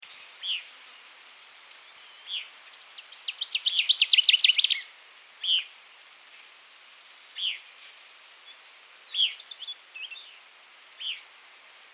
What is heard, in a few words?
Northern Waterthrush, Parkesia noveboracensis; warblers